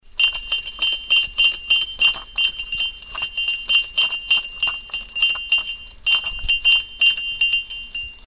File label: Saxon bells; Saxon Church